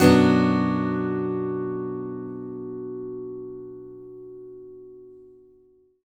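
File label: Acoustic guitar B minor up, Country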